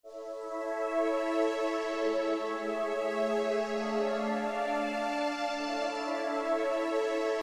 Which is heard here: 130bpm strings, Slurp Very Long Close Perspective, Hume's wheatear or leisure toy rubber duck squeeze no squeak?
130bpm strings